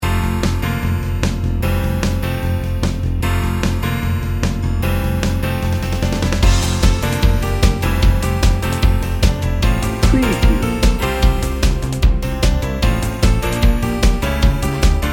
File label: upbeat inspiration